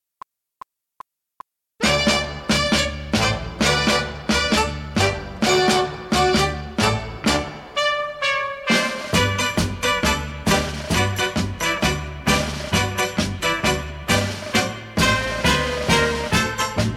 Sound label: Ballroom 40s Tango, G; backing track